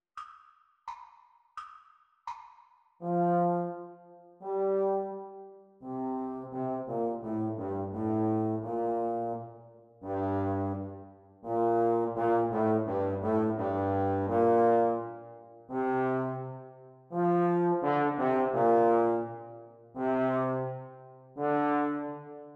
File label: Playalong for Trombone, Speed; Exam Speed